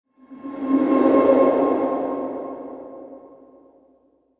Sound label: Musical Swell, Musical Swell Filter Sweeper Reverb; Swells; Blastwave